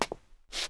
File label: krok soup; Mafie 1